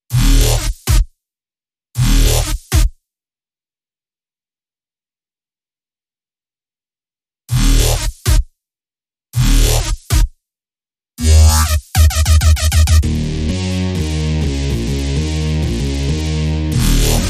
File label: Synth